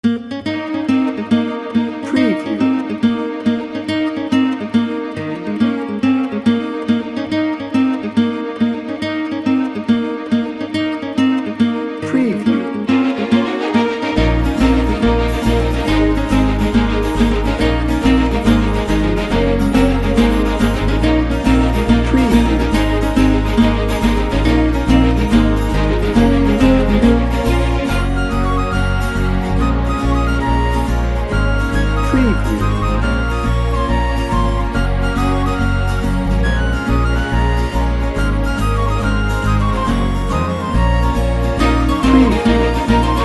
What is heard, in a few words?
triumphant warrior no drums